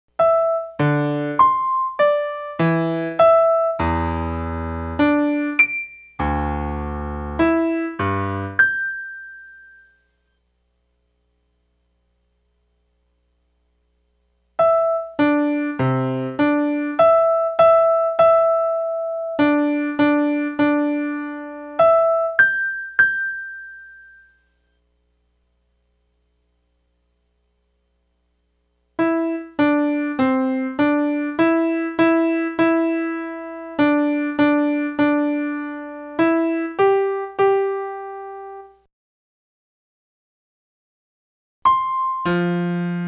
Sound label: Scrambled Stretched Melodies